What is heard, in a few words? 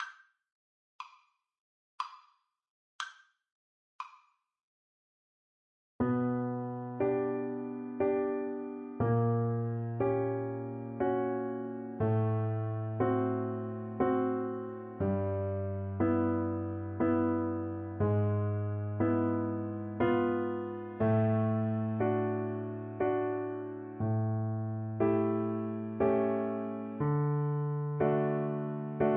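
waltz VLC karaoke, Cello; chopin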